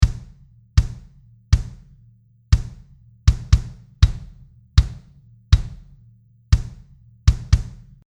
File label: Bass Drum